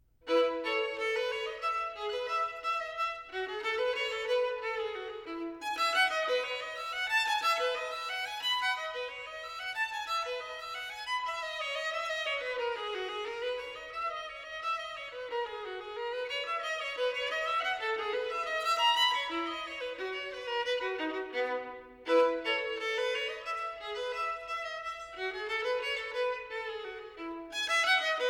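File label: Partita for solo violin, Bourrée; No. 3 in E major, BWV 1006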